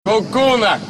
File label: ku ku; prikolnyy rington na sms